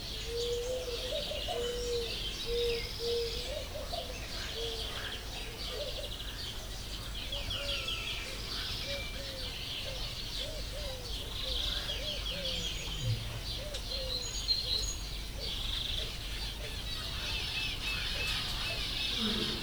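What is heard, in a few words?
lion roaring at the white-handed gibbon; jászberényi zoo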